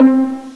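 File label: pizzicato, string instruments; Sound Effects for Multimedia - Volume 1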